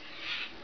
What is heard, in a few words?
snail emerge; the-hat-chooses-the-wizard